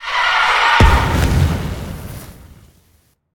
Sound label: phoenix death; smite; npc